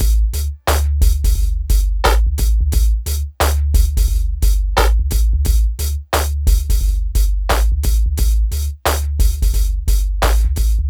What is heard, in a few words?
drum, Hip Hop and R&B Drumloops